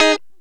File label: Lounge Guitar Chick Major G#2; Zero-G Kocktail Kollection